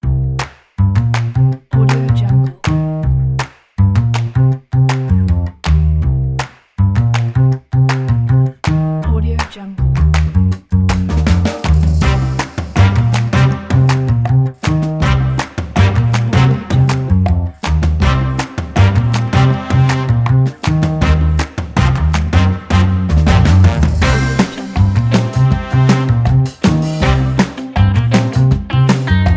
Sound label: quirky funk retro